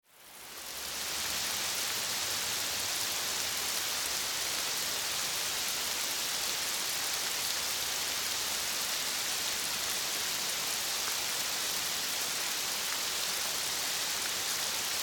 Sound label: rain